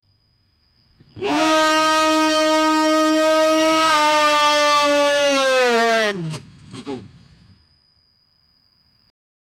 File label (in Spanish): el sonido de una pelicula, western